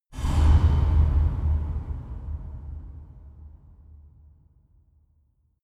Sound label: reverb metal soft large